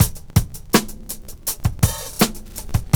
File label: SLOBALLAD 81, loop